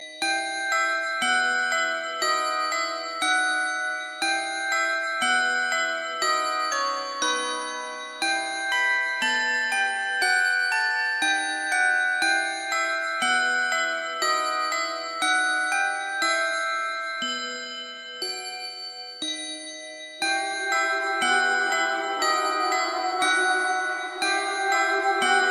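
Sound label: scary melody music box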